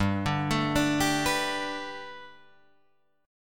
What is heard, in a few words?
G Major Add 9th, Guitar, Drop D; down